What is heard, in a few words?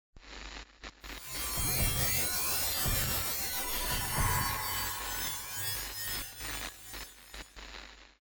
Planet Hover; Harvest Massive Encounter